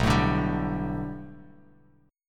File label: B Minor 7th Sharp 5th B Bass Standard, chord; Bm7#5 strummed; JGuitar